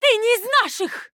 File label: Female Human Attack